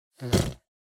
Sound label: Poo Poo Cushion